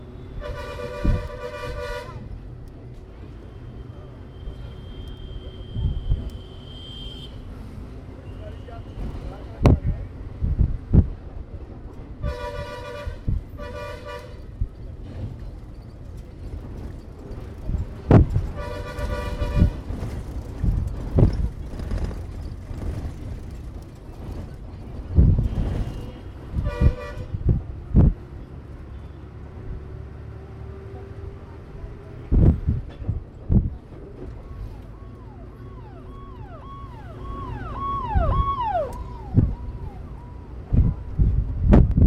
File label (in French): Inde dans le bus de Jaipur vers Agra